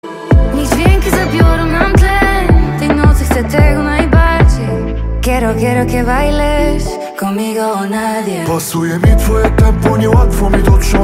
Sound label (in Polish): 100 bpm, dzwonki